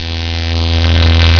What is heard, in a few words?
electro